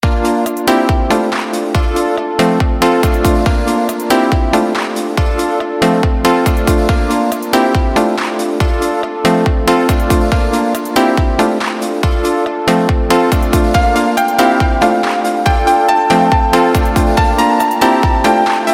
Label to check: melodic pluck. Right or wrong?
Right